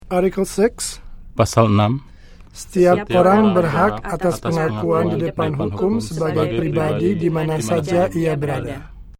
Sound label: Indonesian chorus